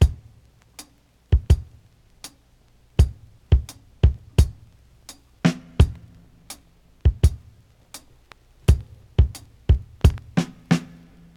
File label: 86 bpm high quality dance drum beat c sharp key